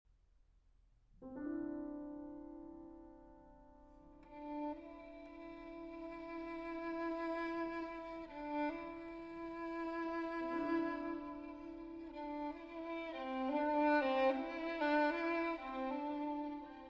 Violin and Piano Sostenuto

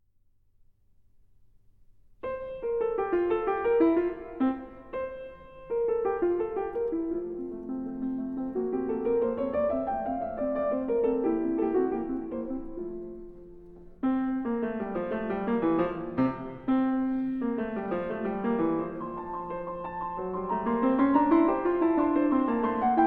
Piano Sonata In F, Allegro; Piano Sonata No.15 In F, K.533494

